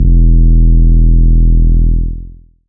trap bass; multisampled; 7rystan Trap Bass

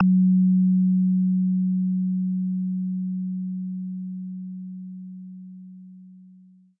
bell instrument note; Multisampled Bell Instrument

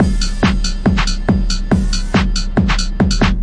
140 warehouse ringing bleep tekno, drum loops

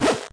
Commodore 64 SID, 8bit SID Drums; LO FI and 8 BIT KITS

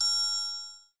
triangle

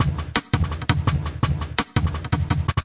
168 gated rim dnb, drum loops and breaks

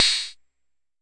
Jomox MBrane, drum machines; Jomox MBrane 11

